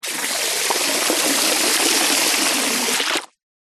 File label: household water spray hose jet into swimming pool short burst close up surface; zapsplat